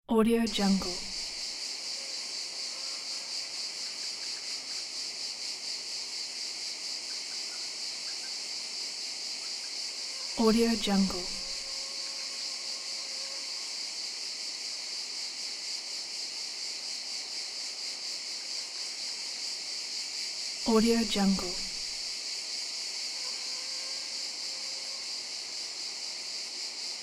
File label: forest insects life ambiance